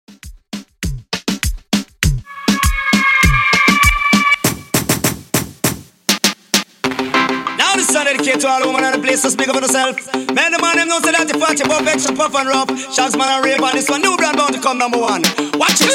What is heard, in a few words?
90s Raggaeton 100 Bpm